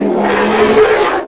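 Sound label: warrior die, bugs; Starship Troopers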